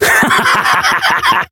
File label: Voice attack; hardy